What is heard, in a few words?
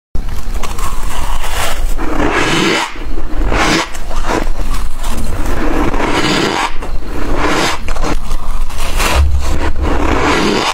Eating dry ice; چالش خوردن یخ خشک